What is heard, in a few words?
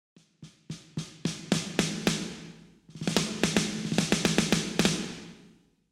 snares; helloween production kit; klangmacht